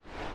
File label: fade swoosh